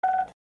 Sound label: bird